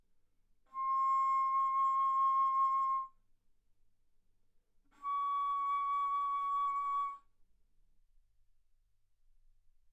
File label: Bass Flute ff C6 Db6